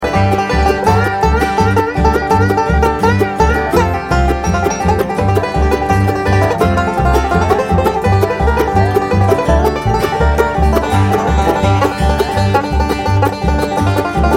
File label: banjo